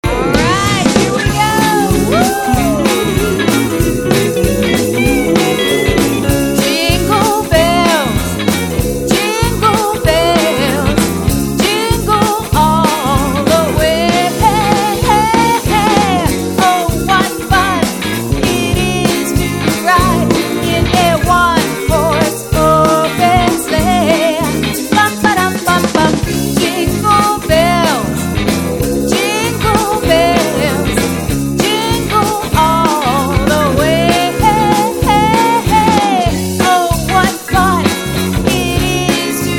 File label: JINGLE BELLS